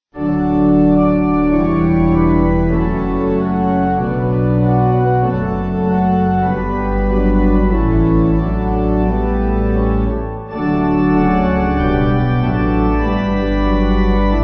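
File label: Kyrie Pipe, Pipe Organ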